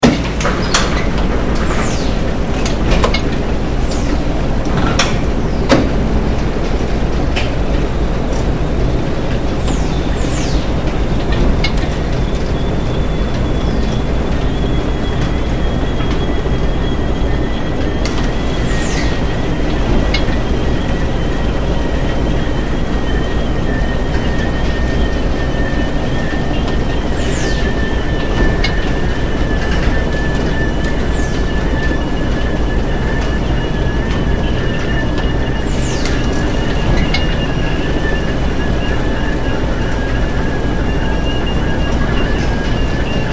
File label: Factory Sci Fi, Ambience, Factory, Science Fiction